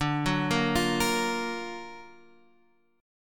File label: F sharp Augmented Major 7th, D